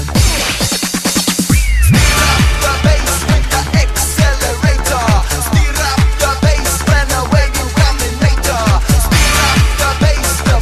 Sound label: Accelerator